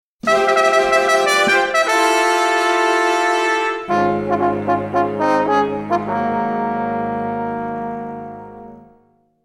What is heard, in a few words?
Fanfares